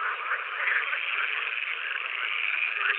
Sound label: Pool-Frog, frogs